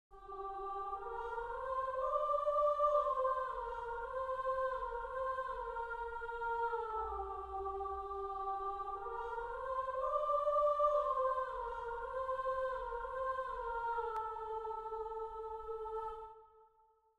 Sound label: Alto